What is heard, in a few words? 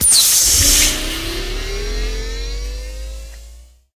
hologram start; Source Engine; tf